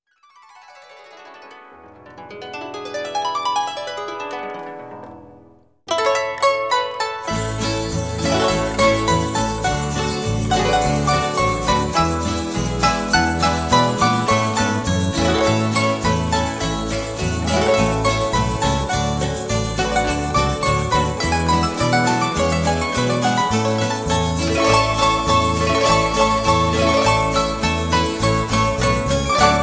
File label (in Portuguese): harpa